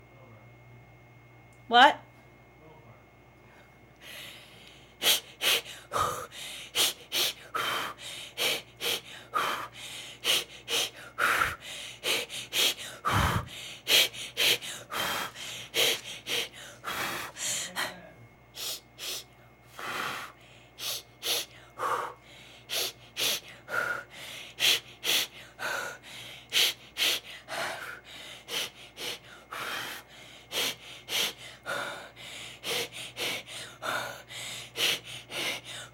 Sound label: Pregnant Woman Breathing Female